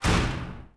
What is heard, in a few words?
freezer close